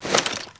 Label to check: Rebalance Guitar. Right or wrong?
Wrong